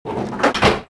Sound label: emplaced mount, weapons